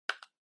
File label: Kitchen Timer Button